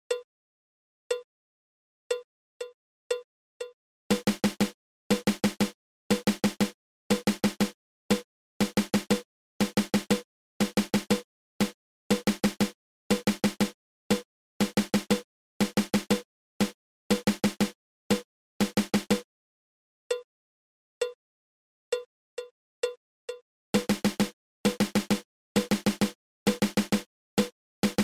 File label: Single Stroke 4